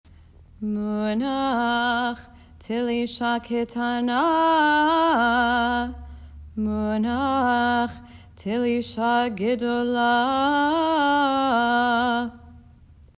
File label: munach telisha k tana munach t lisha gedolah